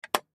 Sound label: Compass Close, Compass Close Camping Gear; Foley; Locks; Objects; Blastwave